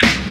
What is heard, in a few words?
SD, HIP, Dance Mega Drums